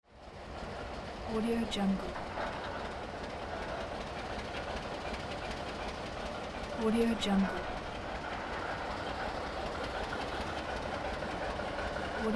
steam train

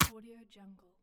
scifi small ballistic weapon shot

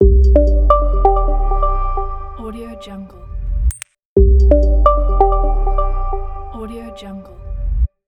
minimal logo ident

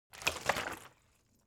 object bottle water sparse upend slosh; smartsoundfx

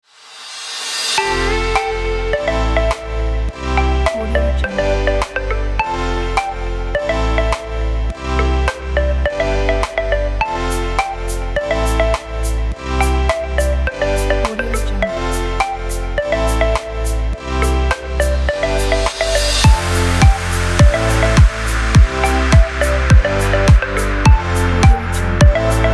upbeat party tropical club

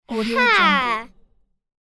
little girl merry smile

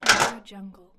metallic kitchen tray stack

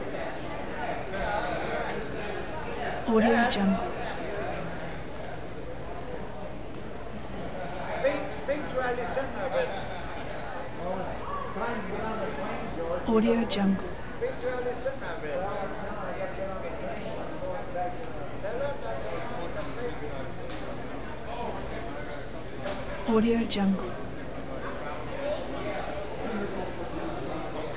train station

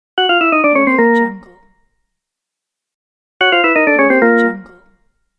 game over; sunsetlover

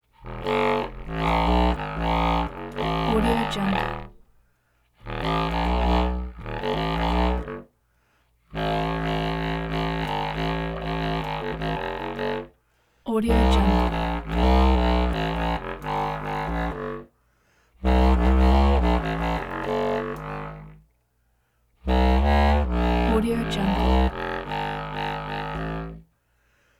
contrabass clarinet conversational low flutters

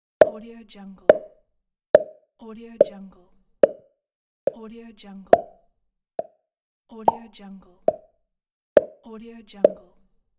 pops

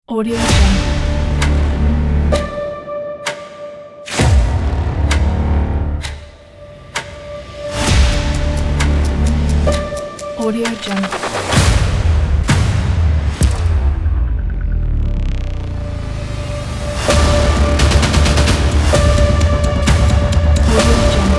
action trailer teaser; arcticfoxproduction

